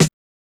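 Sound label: HSE SD, house snare drum; X-Static Goldmine 1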